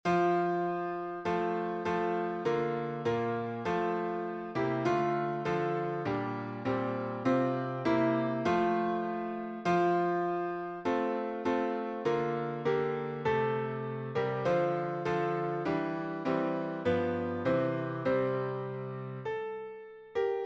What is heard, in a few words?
piano synth; Little Free Music Library